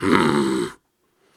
Grunts and Chants, Viking Vocal

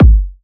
Kick, Techno, One-Shots, Drums

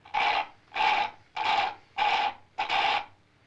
LoFi Group Soda Suck; Vintage Cartoon; Comedy Vocals

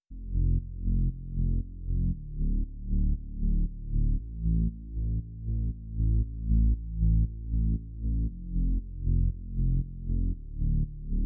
117 BPM A Min Reese, Sunset; Melodies; Loop Stems; Cymatics; GHOST (Beta Pack); Blizzard

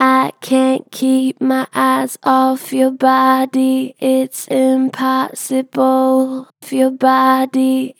Pitch Flat Gentle, Vocals; I cant keep my eyes off your body; Harmor Vocals

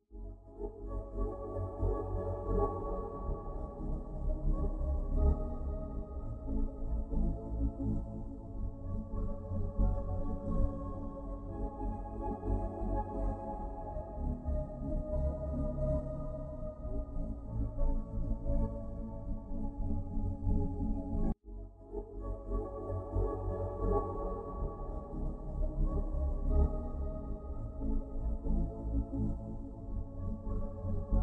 90 BPM C# Min Texture Pad; Loop Stems; Cymatics; Violet

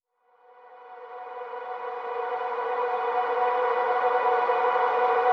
Reverse - B Min; Cymatics; GHOST (Beta Pack); Chasm

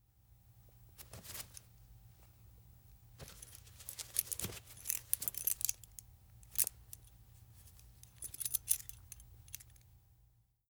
Handcuff; Fairlight Sound Lib

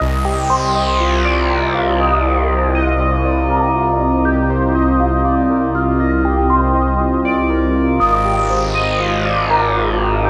120 BPM C Min, Melody Loops; Cyber Collection

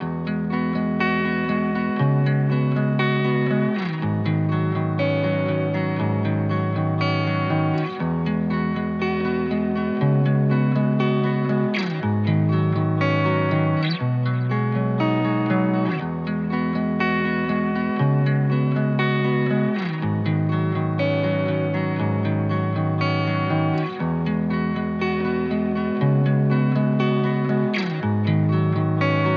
120 BPM B Maj Main Guitar; Guitar Pack; Loop Stems; Cymatics; Miami Guitar Pack - Pt 2; Tremble

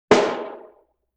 Drum Rim Shot Roomy; Vintage Cartoon; Drums & Percussion

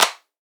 Riddim Clap

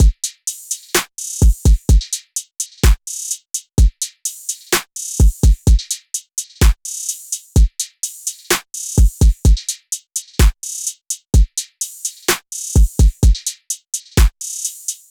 Drum Loop - 127 BPM; Grand Slam; Cymatics; DOPE DRUMS VOL. 1

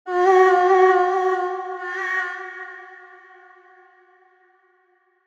Chant, Vocals

